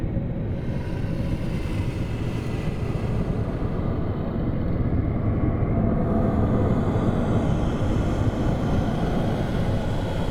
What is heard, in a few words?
cloudkill loop; side quest society